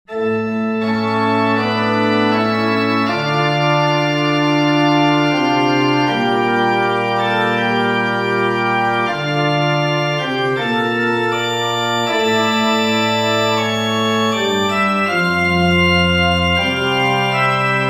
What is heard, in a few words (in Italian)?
agnello di dio organo